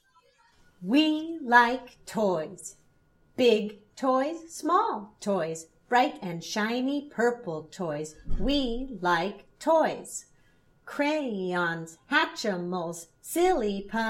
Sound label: We Like Toys with vocal